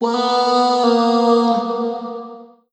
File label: Omnivox oneshot Ebm, Vocal; One Shots; Slate Digital Omnivox Vocal Sample Pack